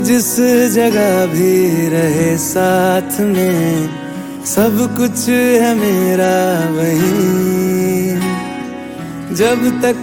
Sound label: hindi song ringtone